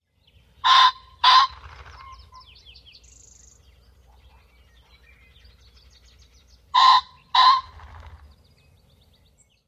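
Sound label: male crow and wing flutter, Ring-necked Pheasant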